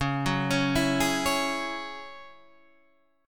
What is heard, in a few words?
Gsharp 7th Suspended 4th Sharp 5th Csharp, Guitar